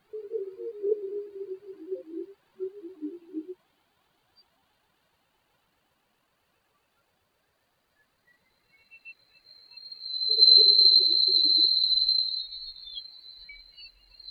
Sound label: Soundscape; Kostas Moschos